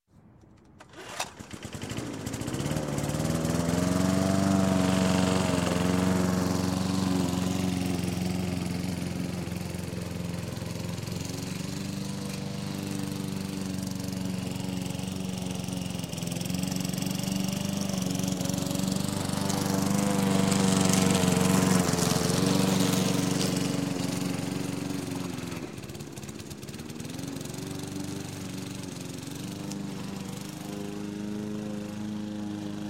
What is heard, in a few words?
Lawn Mower Gas Start, Cut, Stop, Gardening; Sound Ideas 2000 Series